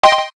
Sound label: digital, keytone